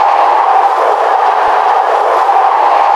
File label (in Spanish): EXT DERRAPE BLOQUEO, F1; AOS F1 2022